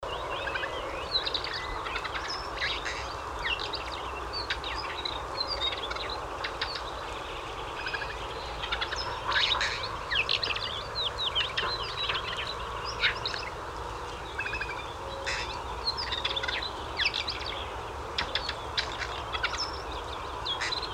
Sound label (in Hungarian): hantmadár hangja